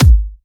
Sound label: Kicks; Vengeance Samples